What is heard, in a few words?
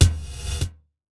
Gated Snare; Univerb; creative gate; Gated Reverb